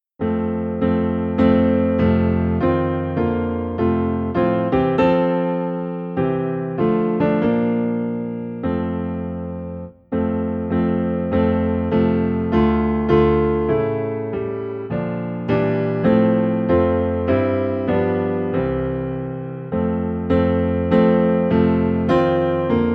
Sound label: Piano Low in F